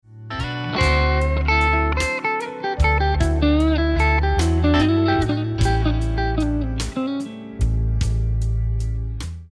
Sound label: Lick, guitar; Blues Guitar Method; Beginner/Intermediate licks